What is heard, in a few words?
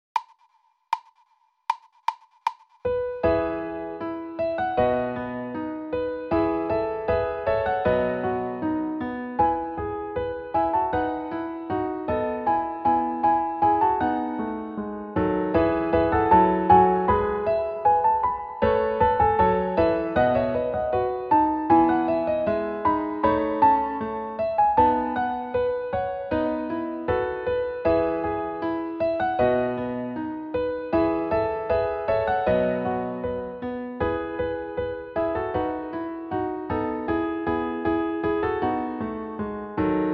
Quartet No.1 Two String Concerto